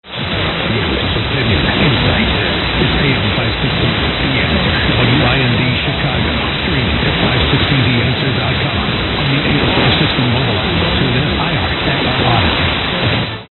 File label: wind